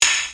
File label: wood ride; Weird and Interesting Hits; single hits